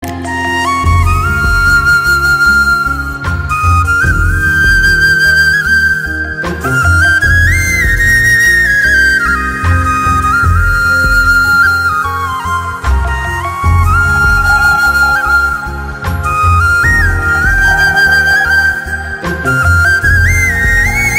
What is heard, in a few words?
Song